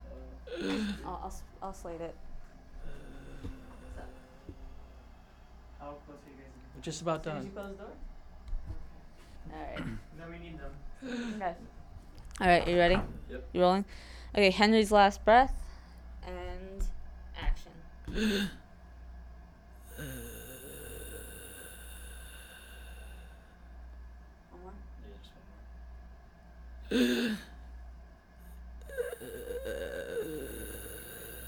Last Breath

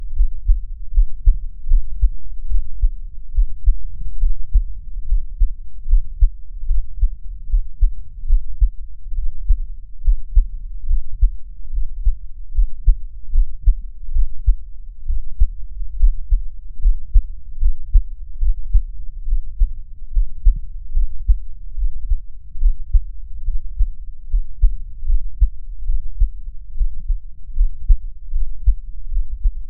Hearthbeat Sound Recorded By A Contact Microphone

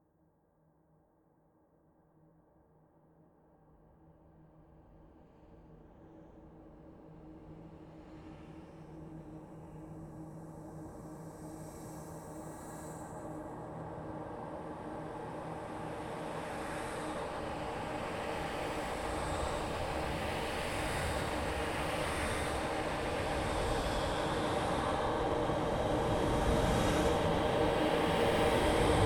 Horror Build Up

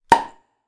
Open A Flip Top Beer Bottle Long Neck

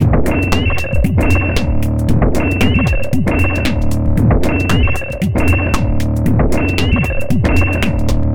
115 Bpm Stab Loop

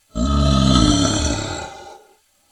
Monster Voice

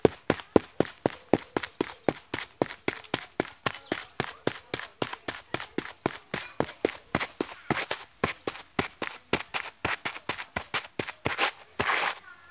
Running On Dirt Path

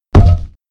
Heavy Metal Thud On Ground

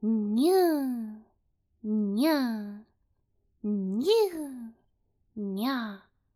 Human Nya Noises

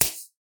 Slap

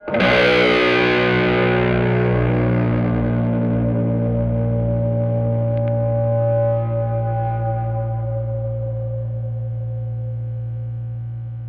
Guitar E Disto Bend Down

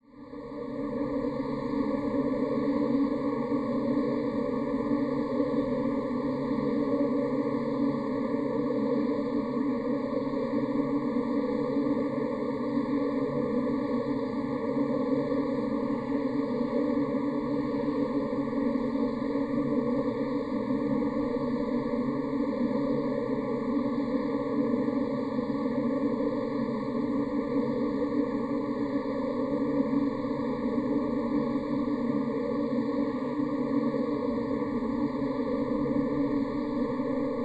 Scary Noise

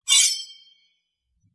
Draw Sword

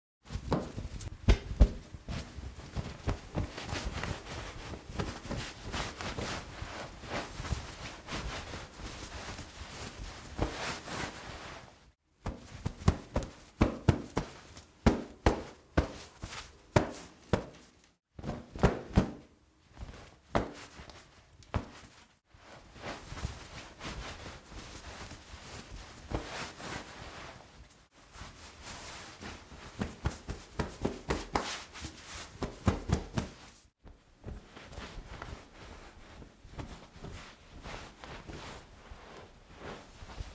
Dry Off With Bath Towel

